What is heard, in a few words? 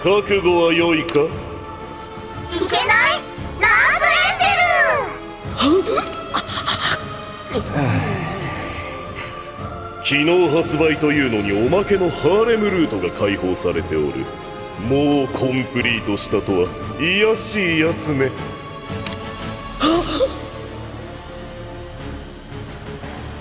RINGTONE